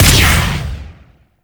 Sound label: Pistol Charged Shot; Operators; Drifter